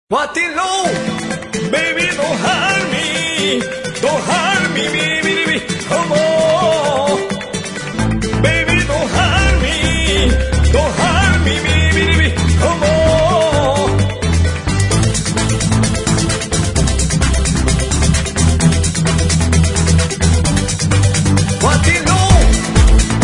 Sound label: flamenco rongtones